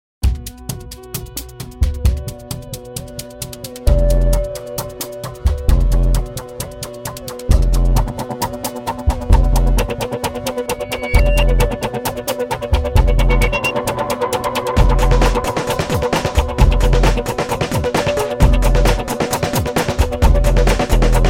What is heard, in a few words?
Dust Shuffle